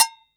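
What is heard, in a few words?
Agogo Ghost; EMU Orbit-3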